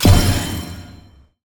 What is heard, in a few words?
xp milestone; ui